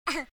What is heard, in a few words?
uuh; wormux